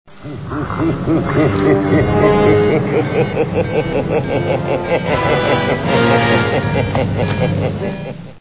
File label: predator laughter